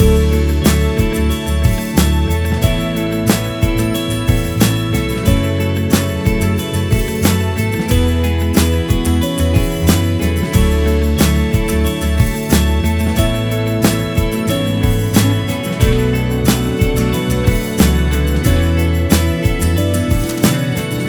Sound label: Always Loop